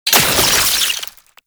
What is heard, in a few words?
Oraxia Brood Spider Spawn; Warframe